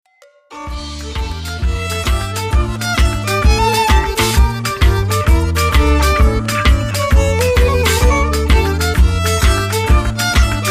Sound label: Hornpipe; captain ward